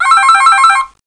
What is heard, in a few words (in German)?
TONRUF